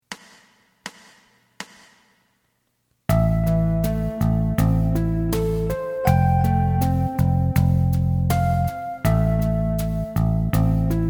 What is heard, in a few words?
lead